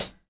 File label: hi-hat